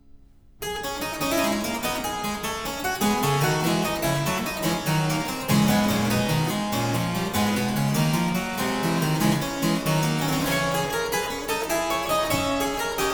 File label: Suite in D Minor IV. Gigue, Harpsichord; ed. E. Roger, 1710